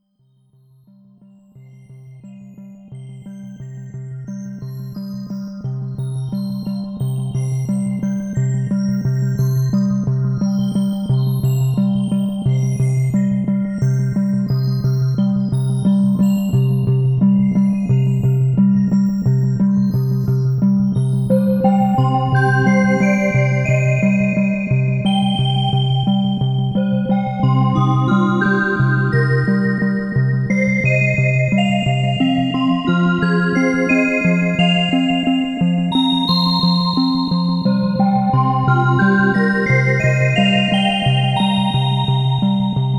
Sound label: Studiologic Sledge